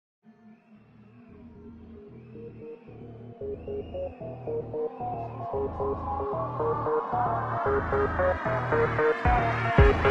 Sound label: Drift Phonk